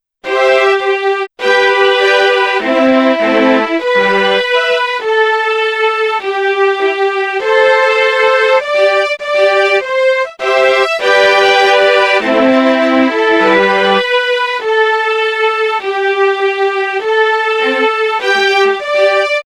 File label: composition strings